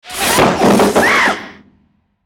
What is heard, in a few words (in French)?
Neige sur la tête, bruitage, Ski